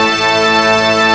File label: HORN, BRASS; M8; CMI Factory